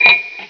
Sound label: ching